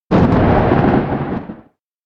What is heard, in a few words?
lightning strike; anemoi